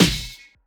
airy sizzle steel snare drum a sharp key

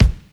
present bass drum sample a sharp key

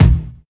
boom kickdrum a key; kick drum samples; single hits one shots

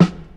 thick steel snare drum f key; snare drum samples

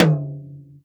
long tom drum d sharp key

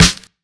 acoustic snare f key; snare drum samples

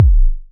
fluffy kickdrum sample a sharp key; Duration 0:01; kick drum samples

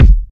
smooth kick sample e key; kick drum samples

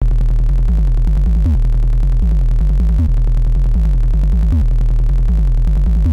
quick muddy bass techno 137 Em; loops

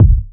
rich low end kick drum sound d sharp key

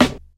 boomy steel snare drum b key

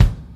2000s mid range kick one shot a key; kick drum samples

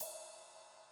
long ride single hit e key

